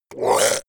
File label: zombie grunt choke male short; kreaturen